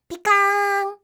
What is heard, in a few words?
pikaann; oto